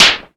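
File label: SP-12, drum machine; Best Service Gigapack 2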